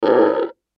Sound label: Monster Bear Groan Grunt; Creature; Horror; Animal; Monster Sound Effects